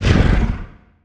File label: Humanoid Mad; The Decline Of Warriors; Monster Sounds Volume II